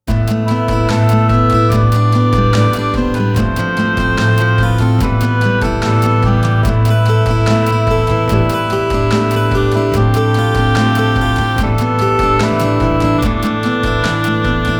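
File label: Soft Theme with Melody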